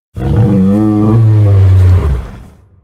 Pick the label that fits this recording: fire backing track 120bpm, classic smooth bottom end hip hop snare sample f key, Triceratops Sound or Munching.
Triceratops Sound